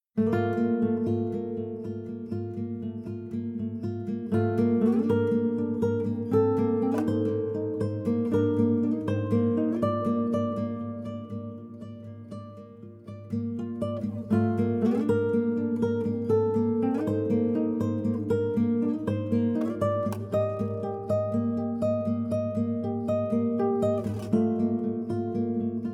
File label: Savannah Music